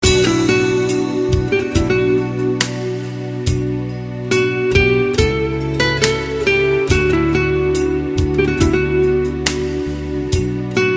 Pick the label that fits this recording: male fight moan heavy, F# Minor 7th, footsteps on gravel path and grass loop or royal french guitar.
royal french guitar